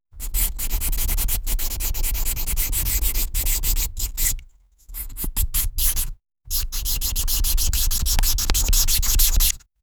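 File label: highlighter over the paper; stationary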